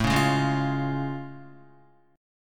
A Augmented Major 7th A Guitar; strummed

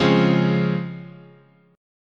C sharp Major 7th, guitar chord

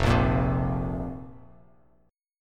F Suspended 4th F, Bass Standard; Fsus4 strummed; 1,1,8,5; JGuitar

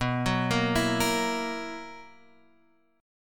B Major 7th Suspended 4th B down Guitar Open F; BM7sus4; arpeggiated

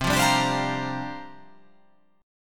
C 9th Suspended 4th C down

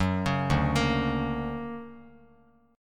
D Augmented Major 7th down Bass Standard; D+M7 arpeggiated; JGuitar

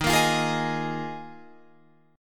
Eb Suspended 2nd Suspended 4th, Guitar, Open F; Ebsus2sus4; strummed

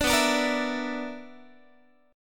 B-Minor Major 7th Double Flat 5th, Guitar; BmM7bb5 strummed; JGuitar

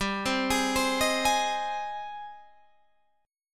G sharp Major G, guitar chord; arpeggiated

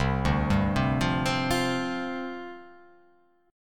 C Suspended 2nd Suspended 4th, down; Csus2sus4 arpeggiated

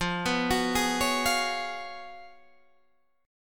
Db 11th F, guitar

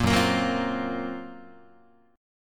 Gb Major Sharp 11th Gb Guitar; strummed; 1,2,1,4,4,4; JGuitar

